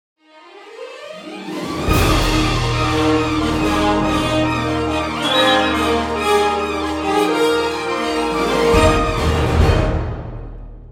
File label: Orchestral String Runs; Majestic Runs; Hendrik Schwarzer